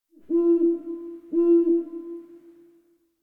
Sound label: Tree Critters Scared, Ambience; Warframe; Duviri